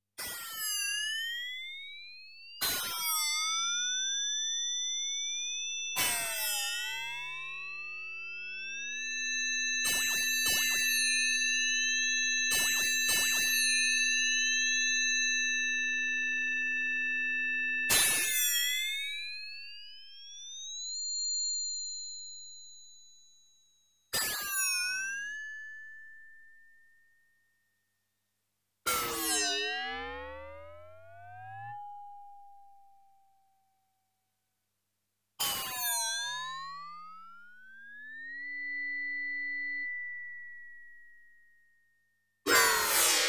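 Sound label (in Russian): электронные звуки стеклянные взрывы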